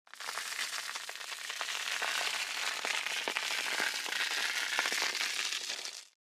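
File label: Sandbag Dragged Across, sand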